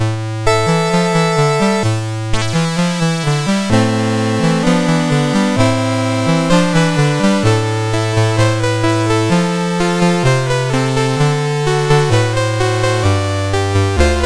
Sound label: MOS6581; MOS6581R2